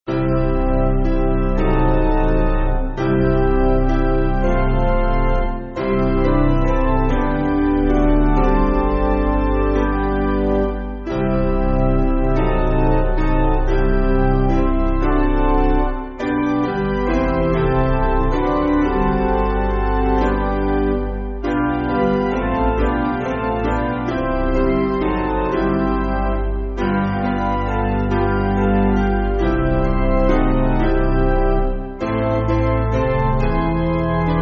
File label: Hymnary Piano; Basic Piano & Organ (CM)